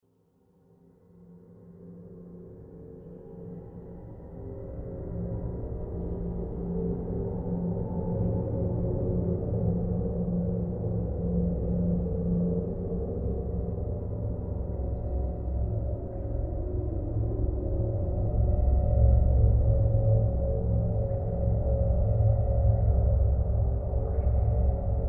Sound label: Horror Drone Dark Scary Ominous; Scary Tone; Blastwave